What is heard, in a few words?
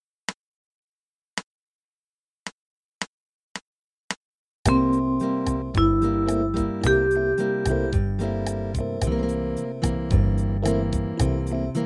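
Dom7 Root, arpeggio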